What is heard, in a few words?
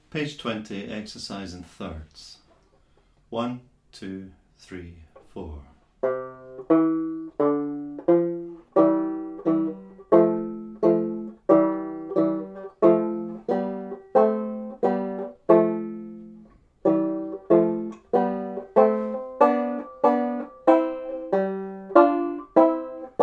exercise in thirds; Bradbury Studies